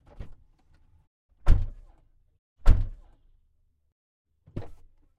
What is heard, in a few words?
ferrari f12 door various interior; ambeo; Sonniss GDC